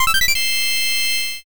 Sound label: fullcombo